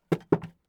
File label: chair drop